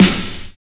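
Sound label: dismal snare; Drums In Your FACE!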